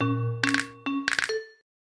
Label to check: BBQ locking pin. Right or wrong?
Right